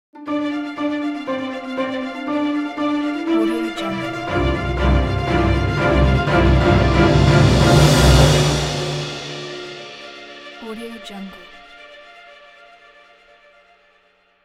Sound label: documentary logo